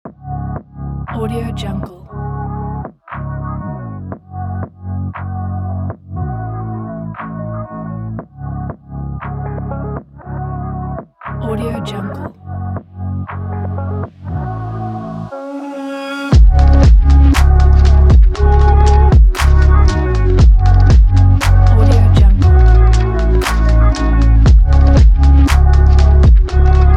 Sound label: abstract slideshow; comastudio